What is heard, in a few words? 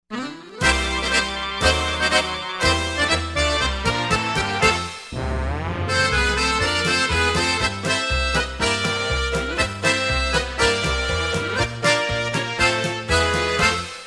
Medley, Polka